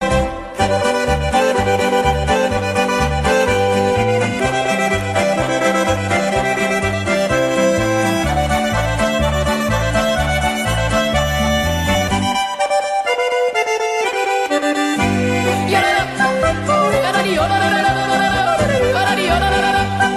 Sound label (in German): ku ku jodel